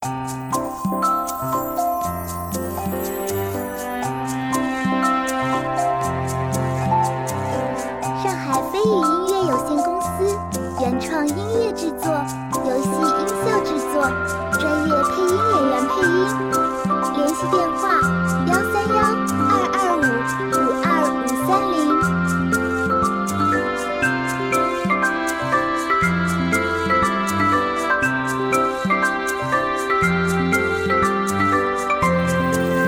Q版可爱明亮; 水果PAPA啪

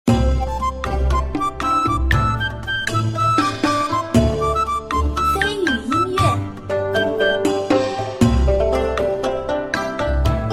新手村-休闲-欢快

